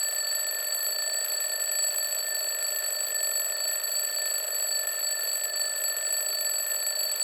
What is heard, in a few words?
Clock Alarm Mid Loop